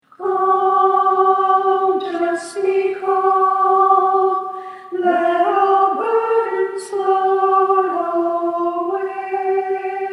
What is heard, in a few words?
song